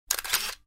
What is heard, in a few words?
snapshot; BackupViewer